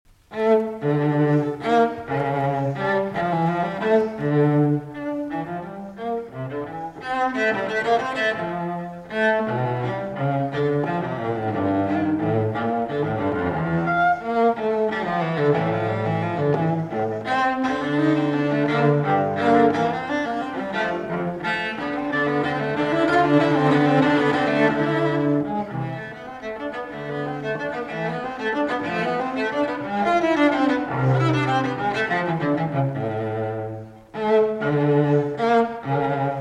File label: Cello Suite in D Minor, Gigue; Bach, JS; BWV 1008 VI.